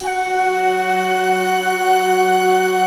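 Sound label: F#4, JAPAN FLUTE; Best Service; Advanced Media Trax Modern Composer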